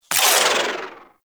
Rifle Projectile Tail; Warframe; Weapons; Corpus; Ruban

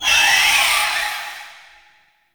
Blade And Whip Heavy Vocal; melee; weapons; Warframe; Dagath Blade And Whip

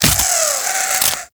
Inf Uzi Embed Burrow; Infested; Weapons

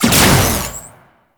Shot, Spear; Warframe; Tenno; Priest Spear; Scourge Prime

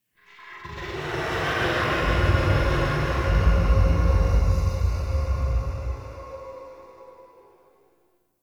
Exhale Interior Small, Ambience; Warframe; Sentient Lair

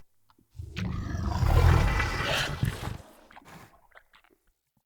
Corrupted Battle Dog Idle Three Yawn; Animals; Vocals

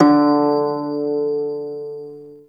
Prime Shawzin Oct One D; Warframe

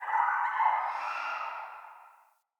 Day Distant Creature

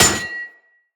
Melee Axe Impact Generic

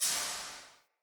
UI Action Hold Loop Start

